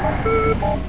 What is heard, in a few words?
Beep